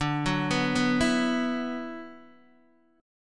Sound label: D 6th Add 9th D, Guitar, Open D